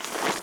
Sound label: quest folder open halloween